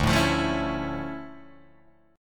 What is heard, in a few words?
D Minor Major 9th D down Guitar Open D